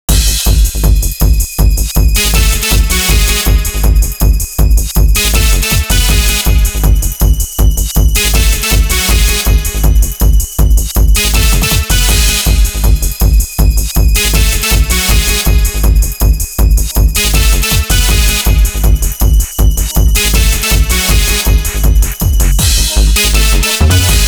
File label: stab add ambience mix; Diverse System